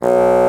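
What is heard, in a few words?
Bassoon B0; Digital Sound Factory Virtuoso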